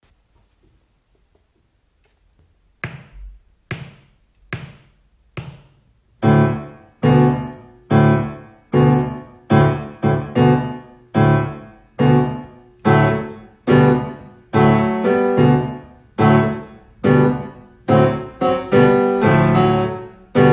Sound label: Piano Duet; Exam Speed; teacher parts